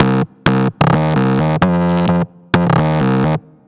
Guitar Shop, Underground UK House & Electro, Synths; Sonic Foundry (Sony Creative Software)